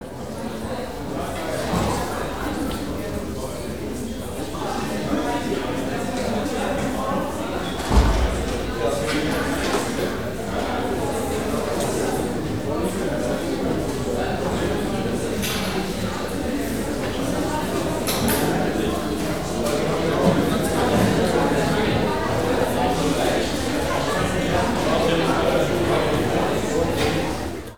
school students; best service Blue Box; crowds interior

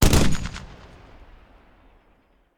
Machine Gun; Sound Ideas; Guns

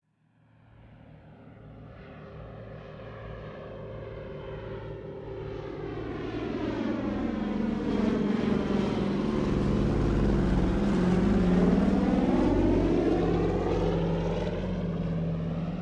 Propellor flyby; KMP Film Video Sound Effects

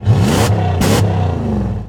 Truck Durango Rev; Trucks

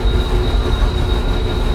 Machine Generator Loop

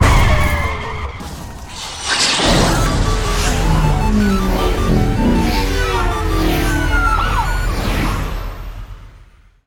icecream bonus; Blind Drive

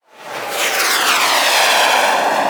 Missile Fly By, pan left to right; Weapons; 1001 Sound Effects

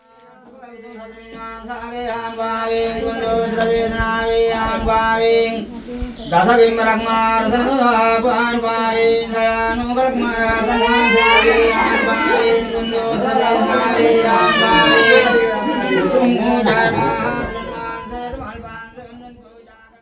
sri lanka pray, church; best service Blue Box

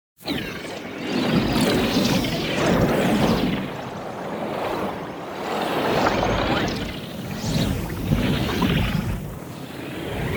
MOVEMENT Molecular Transformation; NEON; boom library

